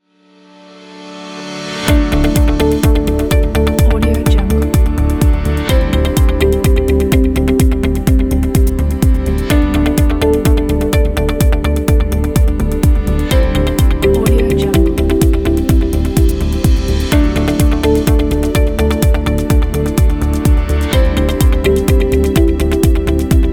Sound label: corporate identity